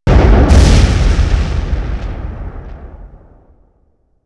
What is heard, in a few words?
Explosion, 5.1 Surround